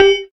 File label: Combo; SAO